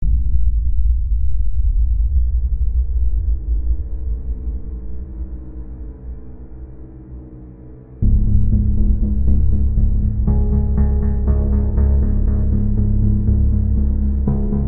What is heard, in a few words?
Murder Drone